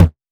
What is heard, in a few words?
TOMS; STY TOMS; Wizoo - Platinum 24 Electronic